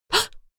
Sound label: Female sudden gasp